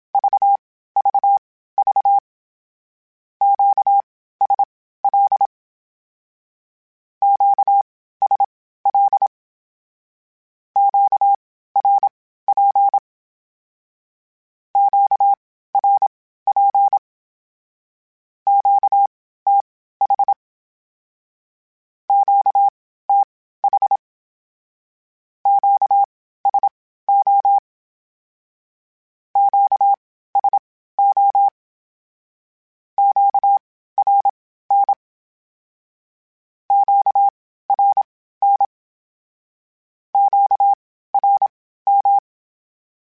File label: Q Calls Twice spaced, Morse Code; HamRadio